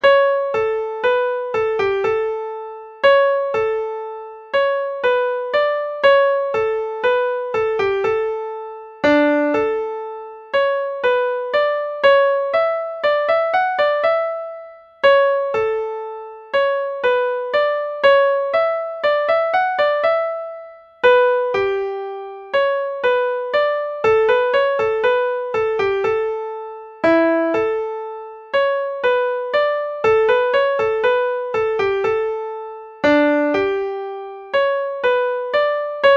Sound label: hornpipe, mandolin; mr keys